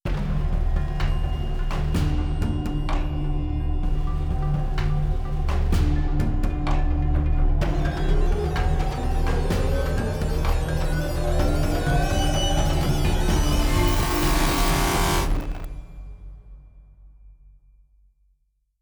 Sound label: Dream Music; Stanley Parable